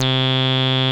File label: MOOG HI C2, ANALOG BASS; Music Store - Claudius Diemeter Schwerlos